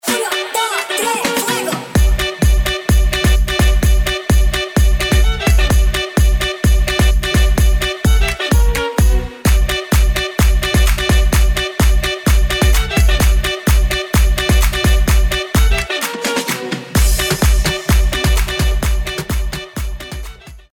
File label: Bucovina BEAT